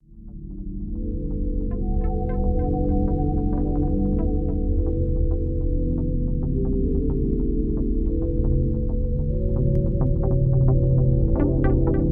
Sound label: MICROKORG; Moody Organs layered with marina vibes